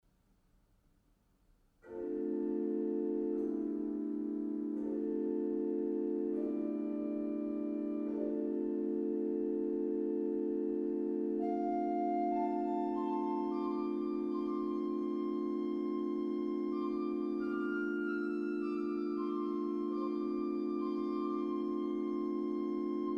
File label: improvisation salve regina; Igreja de Santo André em Mafra